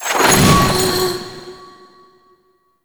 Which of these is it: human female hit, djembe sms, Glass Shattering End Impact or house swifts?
Glass Shattering End Impact